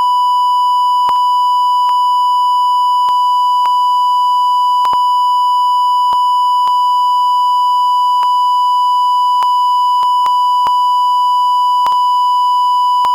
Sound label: flatline; badending; Five Nights at Ronald's